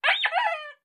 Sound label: Horns 1 Various Party